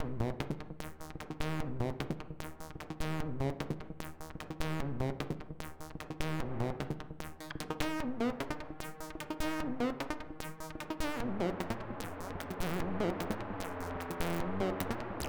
The delay is feeding constantly in the background, the LFOs of the FX track is shaping it; Three short recordings